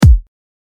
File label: Kick